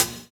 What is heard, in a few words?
Closed Hat, JUNGLE BREAKS; ORIGINAL JUNGLE BREAKS; JBK DRUM SOUNDS; JBK HATS